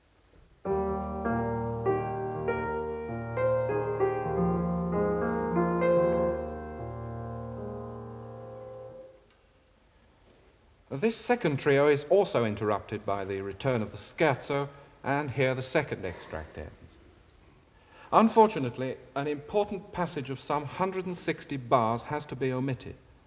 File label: Music Example (Piano)